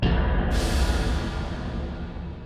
Tymp

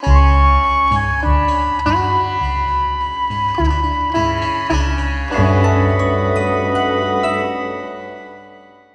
Bari Sax

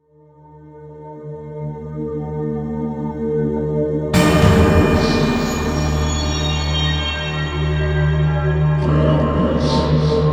Boss Loop; HitlerBoss

